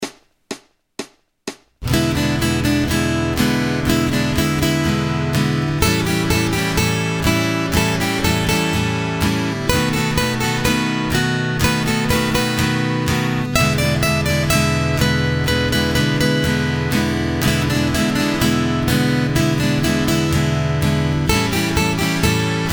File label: Acoustic Accompaniment, acoustic guitar